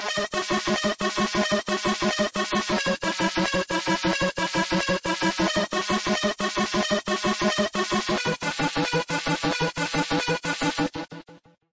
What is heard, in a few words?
synth; On A Roll